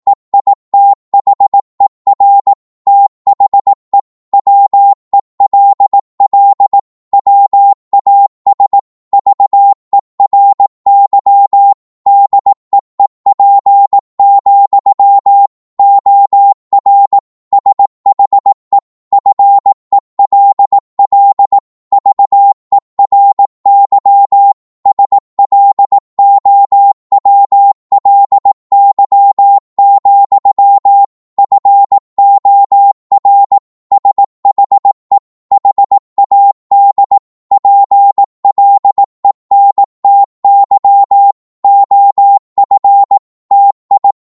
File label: 18wpm, Morse